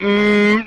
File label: mmmmmm